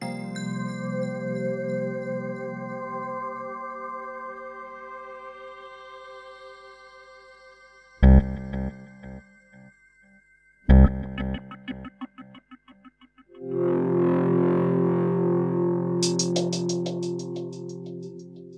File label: space ambience; Worms 3D